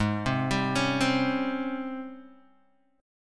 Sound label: G sharp Major 11th G sharp, Guitar DADGAD